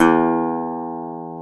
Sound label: GTR, Dobros, National